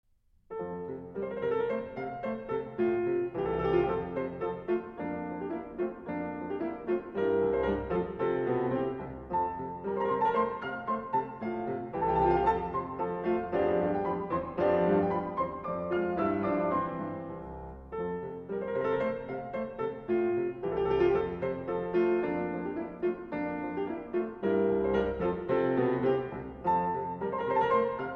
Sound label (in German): Abendmusik, Allegretto; A. Jensen